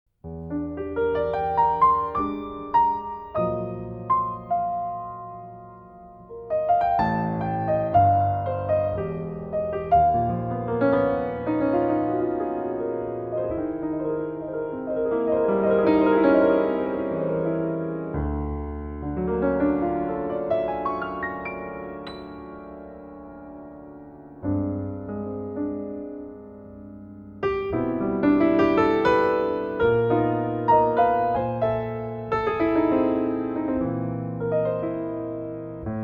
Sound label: Soprano Saxophone and Piano, Waltz; Suite for Soprano Saxophone and Piano